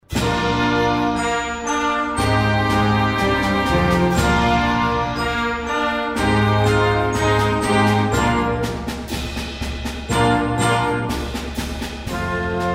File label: Regency Fanfare, wind band